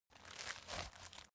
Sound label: folder file jacket turn